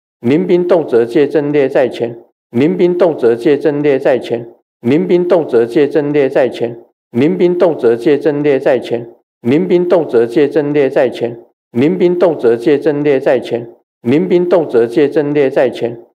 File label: Nine-character Mantra